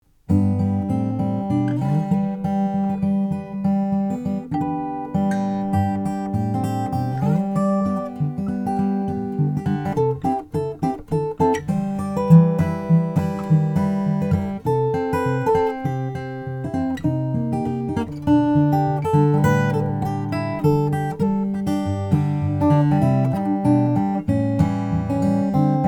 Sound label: kevin ryan guitars nightingale